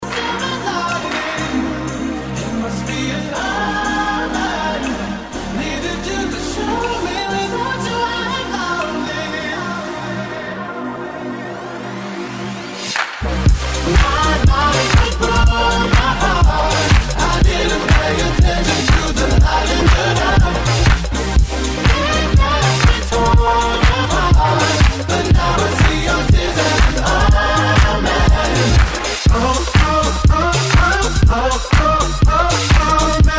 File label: tryout music